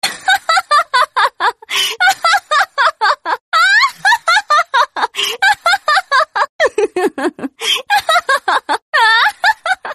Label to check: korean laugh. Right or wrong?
Right